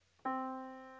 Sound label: yamaha Disk